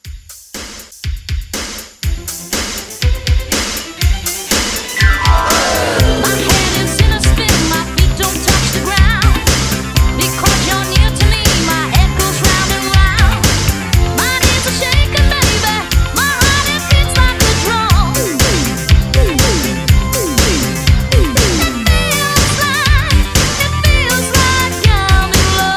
80s Dance Re Drum, 121

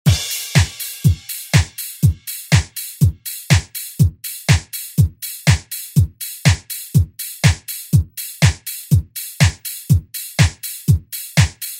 80s Re Drum; DJ Mhark

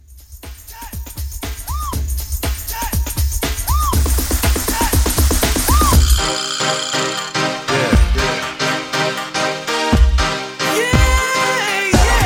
90s Reggae Fusion Re Drum

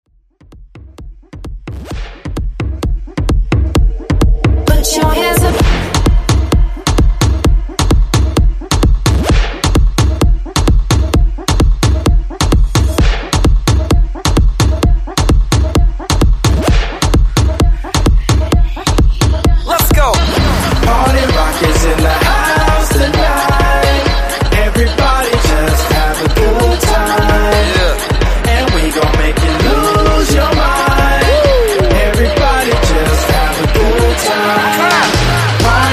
EDM

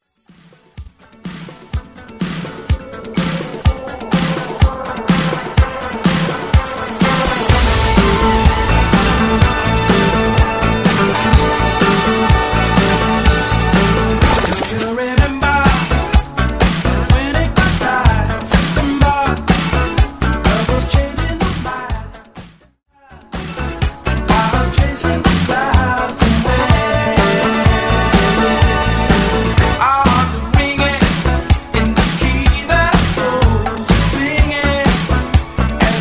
70s Re Drum 125BPM

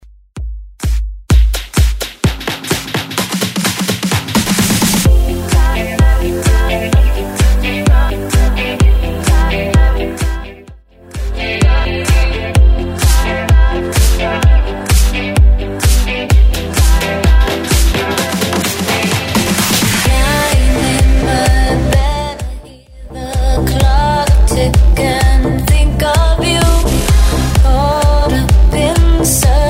Bootleg Smashup 128bpm

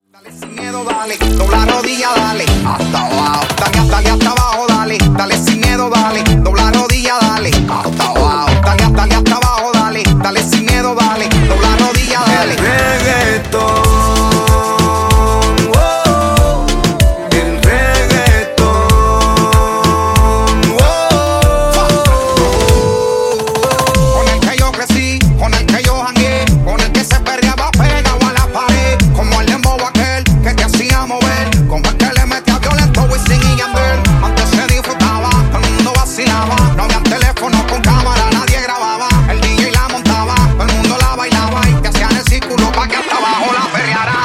Old School 95 bpm; Dj Intro Outro

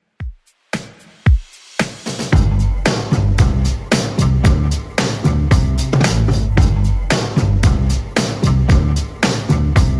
60s Redrum 113bpm

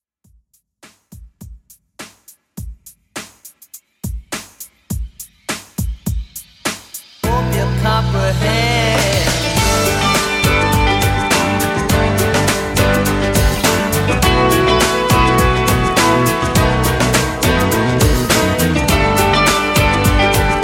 Pop Rock Redrum 103Bpm

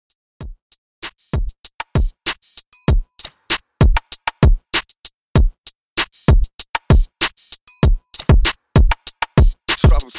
Old School Redrum 97 Bpm

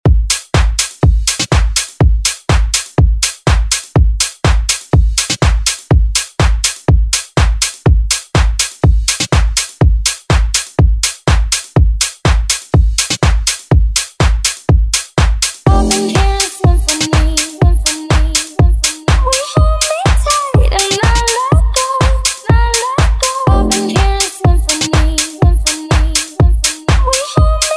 Mashup Bass House Remix 123bpm

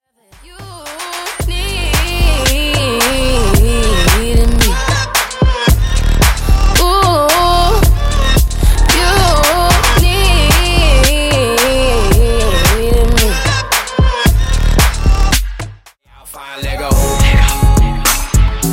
Throwback HipHop RB Megamix 124-88 Bpm

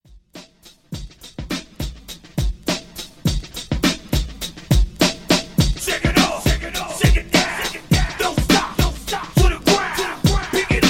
90s Hip Hop Rap Redrum Hype; Remixfreakz

